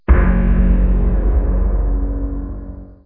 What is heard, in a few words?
anti matter bomb pre implosion